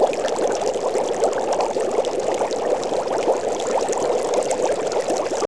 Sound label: boiling water, Boiling and Bubbling